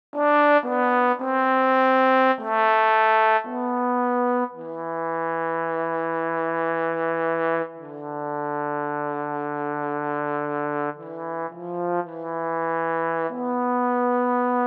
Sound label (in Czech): TROMBONE s vibratem